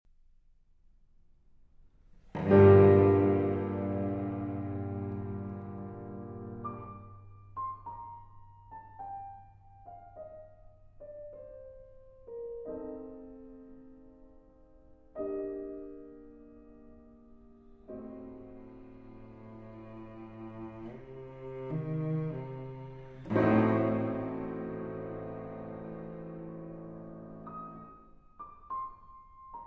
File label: Cello Sonata in G Minor